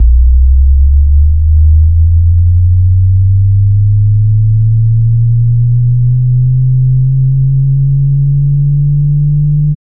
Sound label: BD Sub; VEC1; Club